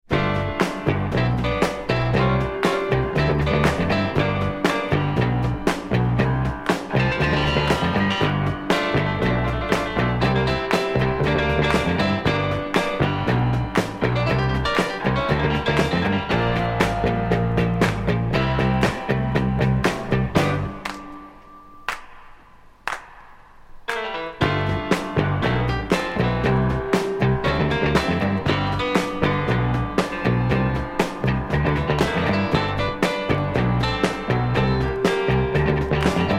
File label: Soul funk break